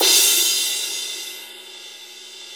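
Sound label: cymbal crash; Best Service Gigapack 2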